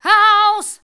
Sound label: ROCK ME, Voice Spectral